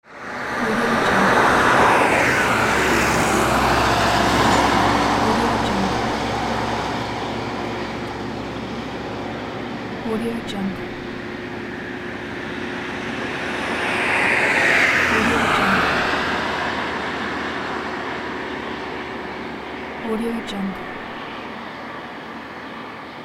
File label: cars passing by